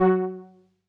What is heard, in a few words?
505, String Machines